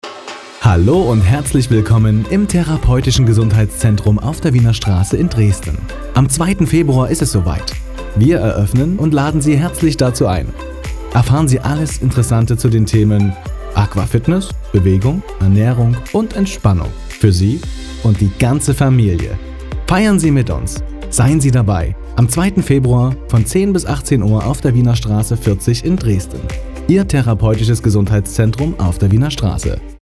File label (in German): Eröffnung Musik